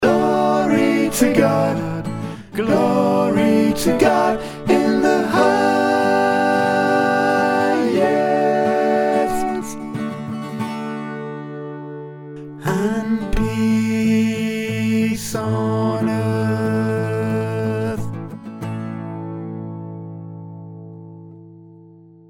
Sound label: Glory To God - satb; my messiah